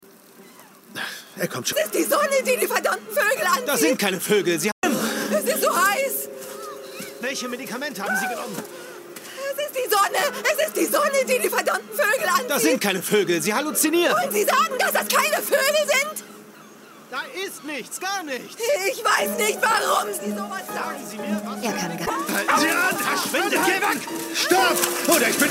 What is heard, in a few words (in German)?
wirres Geschrei; Doc Martin Staffel 8, Folge 5